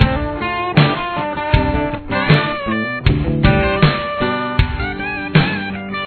run

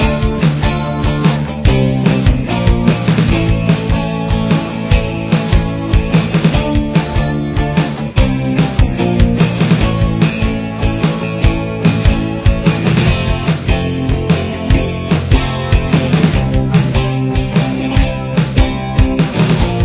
solo backing, guitar

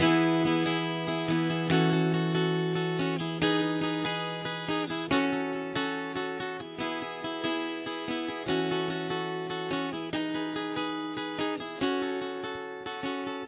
chorus, guitar

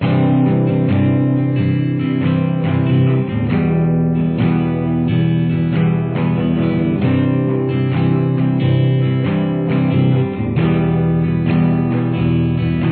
chords

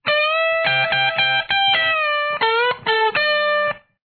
lead, guitar

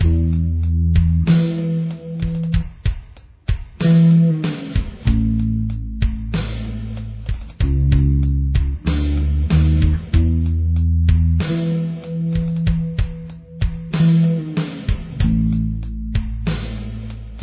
bass

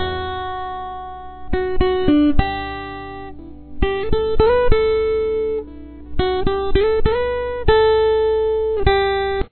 fill, guitar

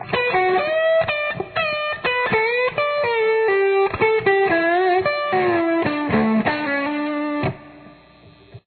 solo

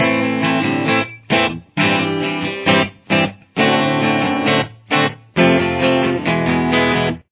chorus, guitar

